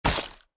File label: Ink Splat